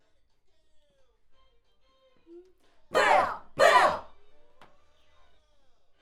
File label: Schoeps AKG, piano